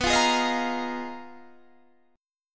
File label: G sharp Minor 6th Add 9th B, guitar chord